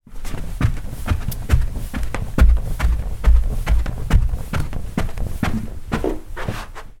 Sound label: footsteps stairs up carpeted bare feet; foley